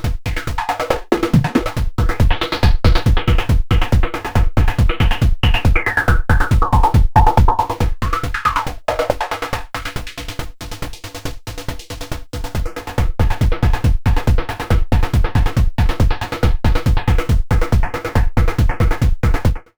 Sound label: Loops And Drones